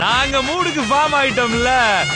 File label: Tamil Punch